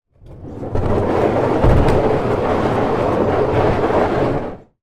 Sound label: boat kayak pull movement on hard ground; transport